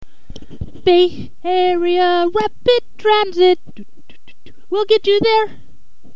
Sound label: jingle